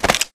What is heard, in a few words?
ammo pickup; Titanfall 2